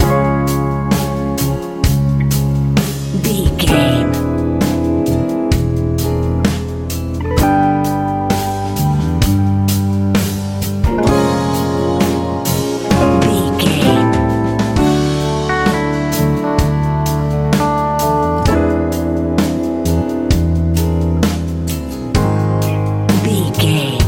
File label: dreamy ballad